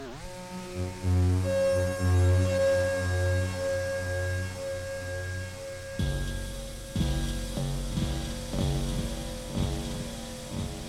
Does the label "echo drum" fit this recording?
Yes